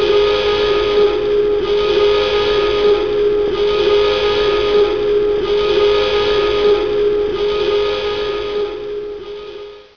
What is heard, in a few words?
c4 siren